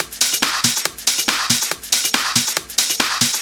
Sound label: Loop 140 BPM; vengeance essential club sounds-3